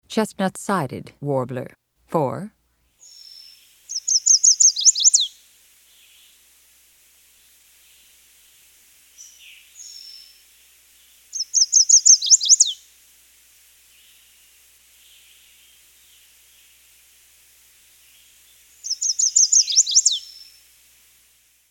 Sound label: chestnut sided warbler